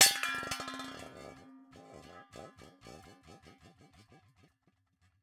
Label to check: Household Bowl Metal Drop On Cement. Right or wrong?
Right